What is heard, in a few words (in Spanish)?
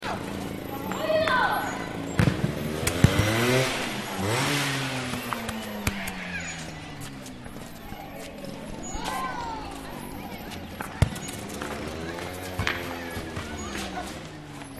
Sonidos La Sierra